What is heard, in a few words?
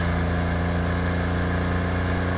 CAR HIGH; SIMUZENSOUND